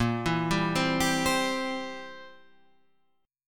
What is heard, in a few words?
C Major A sharp; arpeggiated; chord; JGuitar